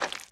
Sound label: slime run, step; blocks; Minecraft; Remastered